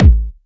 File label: rich mid range kick drum one shot a key